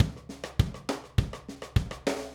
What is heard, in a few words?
102 Rim Grooves, Drums; Pro Tools Essential Loops; Pop Rock; Moderate Tempo